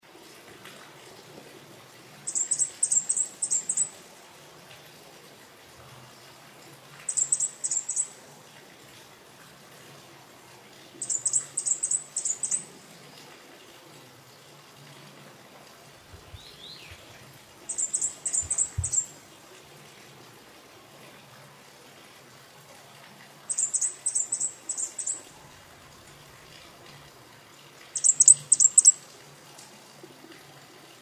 紅胸啄花; 啄花科/留鳥

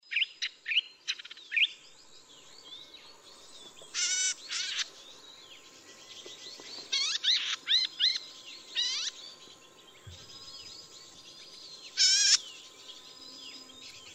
黃頭扇尾鶯; 白頭錦鴝; 扇尾鶯科/留鳥

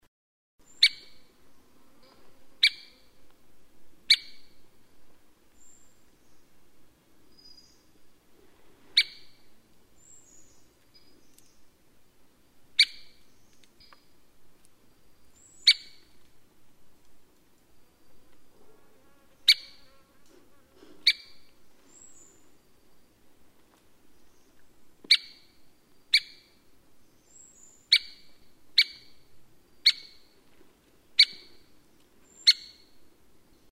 大赤啄木; 白背啄木鳥; 啄木鳥科/留鳥

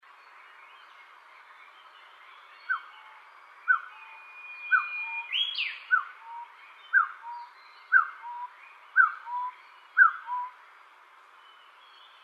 台灣山鷓鴣; 深山竹雞; 雉科/留鳥

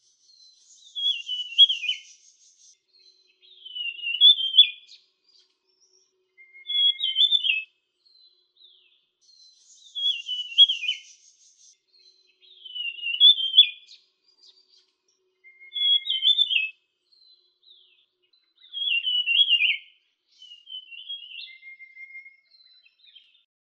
頭烏線; 烏線雀眉、褐頂雀眉; 畫眉科/留鳥

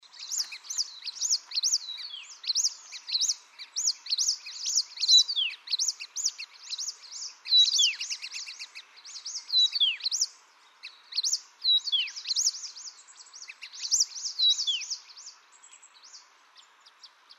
灰喉山椒鳥; 山椒鳥科/留鳥

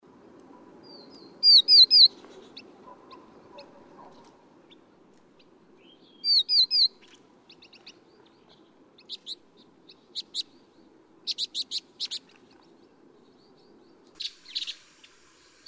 粉紅鸚嘴; 棕頭鴉雀; 鶯科/留鳥

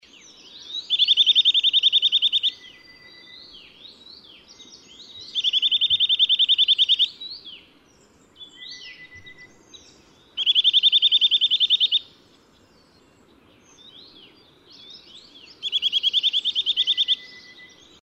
茶腹鳲; 茶腹鳾/鳾、普通鳾; 鳾科/留鳥

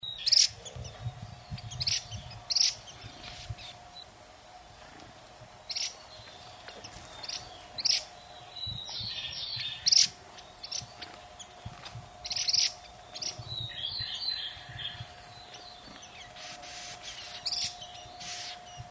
黃羽鸚嘴; 黃羽鴉雀; 鶯科/留鳥

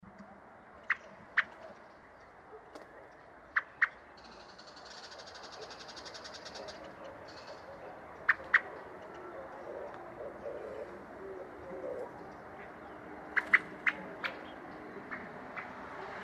赤腹鶇; 鶇科/冬候鳥

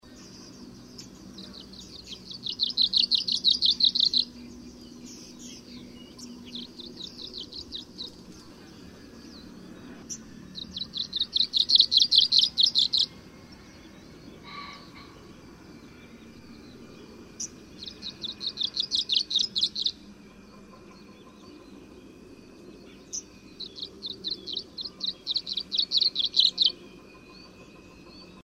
極北柳鶯; 柳鶯科/過境鳥、冬候鳥

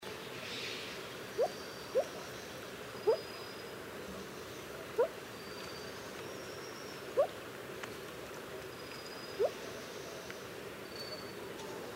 藍腹鷴; 藍鷴; 雉科/留鳥

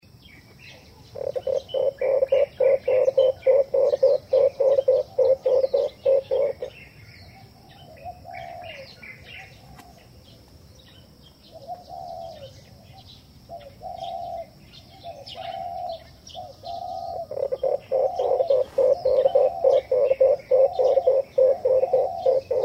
紅鳩; 火斑鳩; 鳩鴿科/留鳥

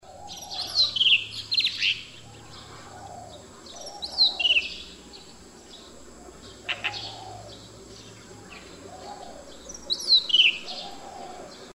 藍磯鶇; 冬候鳥、留鳥

